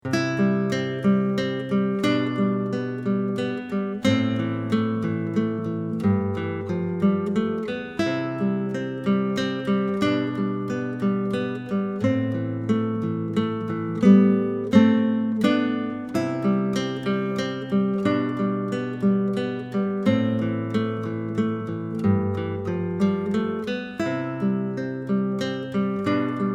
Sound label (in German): Popsong-Git.-Duo; leicht/mittelschwer